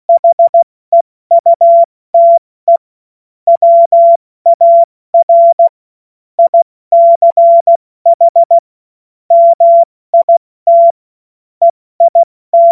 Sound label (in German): Witz, Morsekurs, 70 F80; Witze